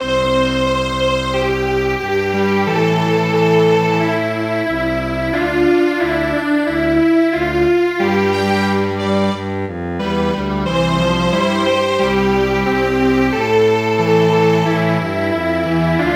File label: Strings Quartet; Bunny